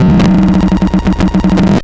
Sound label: grind, EMU XL-7